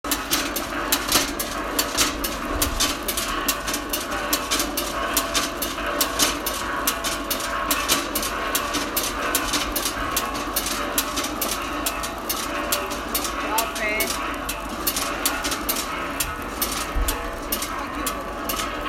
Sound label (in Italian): Defogliatore olive manuale